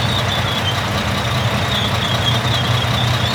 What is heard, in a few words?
tank loop